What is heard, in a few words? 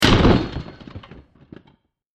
Massive Impact With Various Wood And Metal Particles; Bursts; Crafted Signals